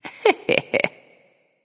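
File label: heh heh, female; smite; voicepack; hera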